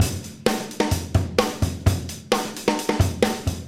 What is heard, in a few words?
Rock, Drum Loops